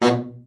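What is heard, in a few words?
baritone, BARITON SAX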